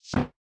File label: Se Sys Stamp